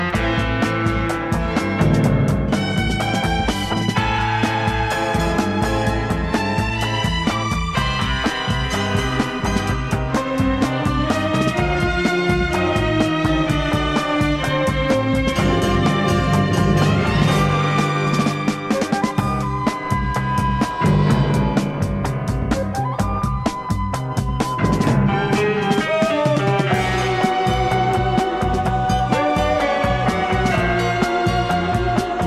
Beat Psichedelico

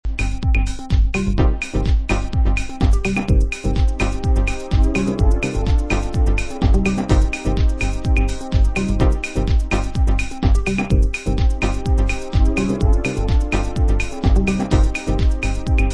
jazz house

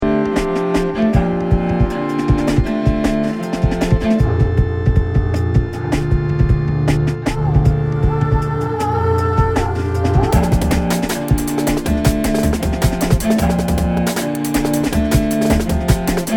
Cello Renoise

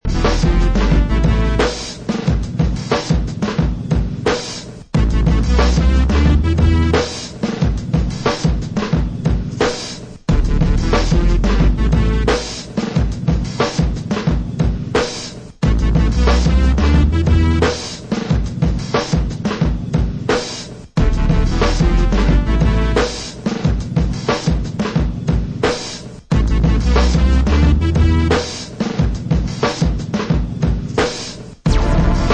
Original Instrumental

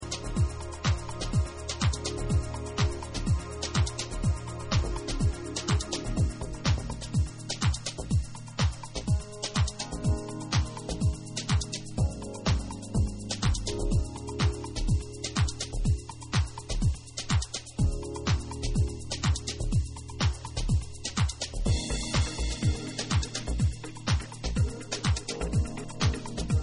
remix instrumental

